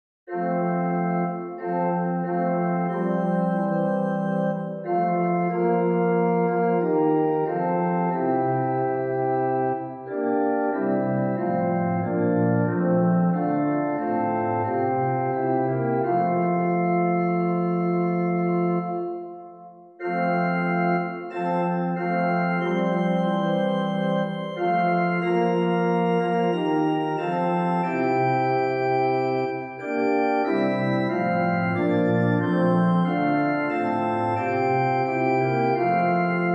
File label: Organ; Drop Drop Slow Tears; 3 Verses